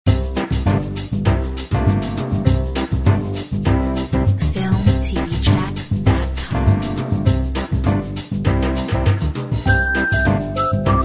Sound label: Mambo Whistle